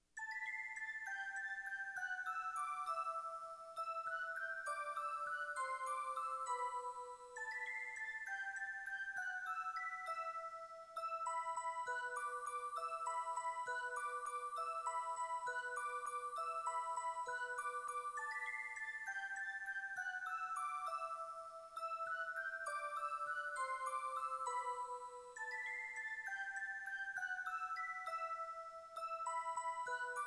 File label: musicbox; ultima